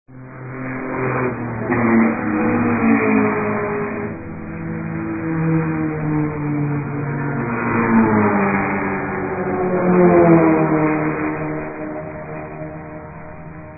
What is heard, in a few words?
Racing Cars